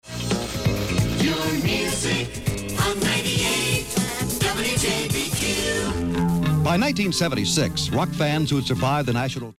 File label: jingle; wjbq